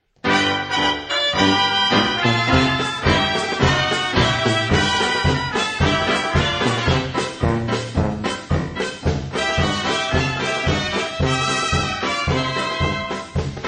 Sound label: polka